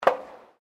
FOOTSTEPS WOMENS HIGH HEELS ESCALATOR STEP

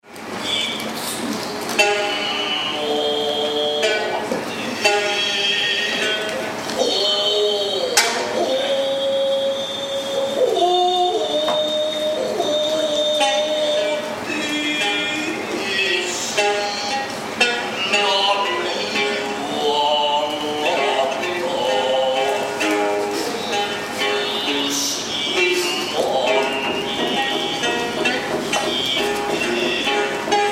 Kabuki Theater Male Sings Plays Biwa, Japanese; Japan, musical performance with male singing; Theater Sound Effects

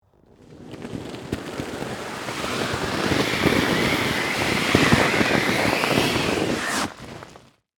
Snow Drag Inner Tube, Across, Snow, Heavy; Weather; Snow Sound Effects; BlastWave

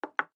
Coaster Set Down, Coaster Set Down Wood Table; Miscellaneous Bar; Household; Blastwave

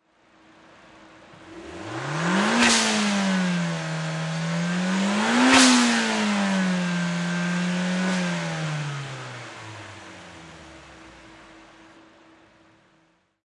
Nissan Silvia Engine Double Rev, Race Car; Auto, Power Car, High Performance Car Sound: Nissan Silvia. Engine perspective. Motor rev, engine revving twice; Lynne Music

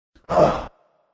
Man Ah Grn, Quick groaning; Groan Quick "Ah" 1

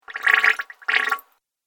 Water Fast Little Bubbles; Water Sound Effect; Splish, Splash, Splosh!

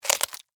Insect Eat Chew, Bite, Crunch, Bug; Animal; Insects Eating

